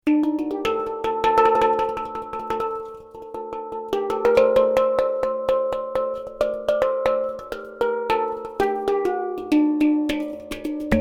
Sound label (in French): son hang up, Steel pan